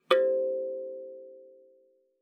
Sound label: KALIMBA C5; Xsample VOL-7